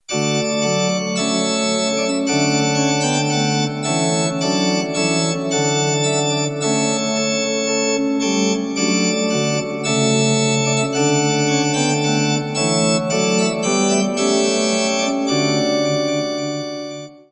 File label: Trumpet Voluntary; Clarke